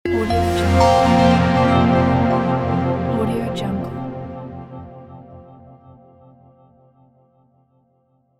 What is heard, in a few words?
modern opening logo